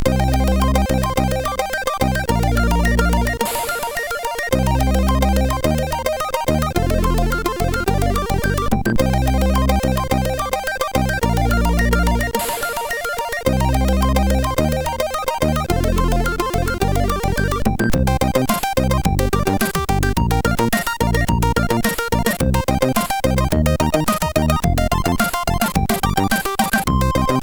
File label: Intro Remix, MOS6581R4